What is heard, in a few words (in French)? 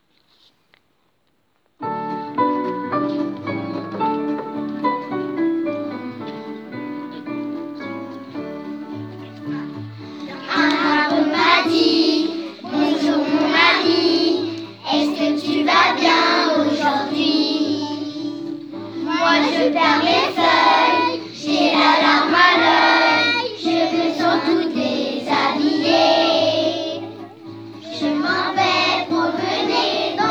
Vocal